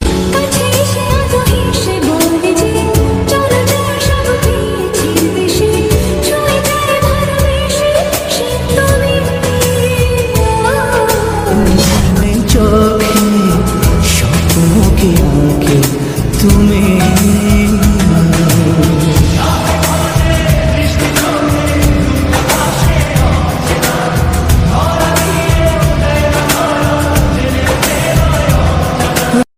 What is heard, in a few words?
lofi bengali ringtone